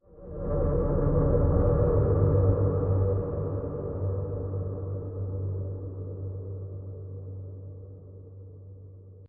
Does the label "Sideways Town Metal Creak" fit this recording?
Yes